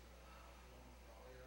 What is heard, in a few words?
labor breath